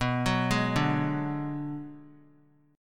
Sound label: B Minor 6th B; Bm6 arpeggiated; 23,25,23,24; JGuitar